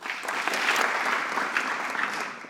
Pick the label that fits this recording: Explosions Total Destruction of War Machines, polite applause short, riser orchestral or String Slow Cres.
polite applause short